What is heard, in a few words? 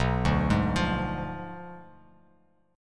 B Diminished 7th B down Bass Standard; Bdim7 arpeggiated